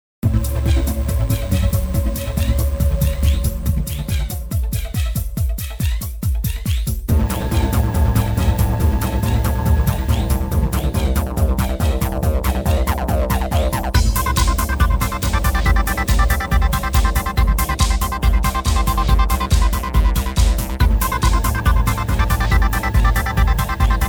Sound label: Feel The Bass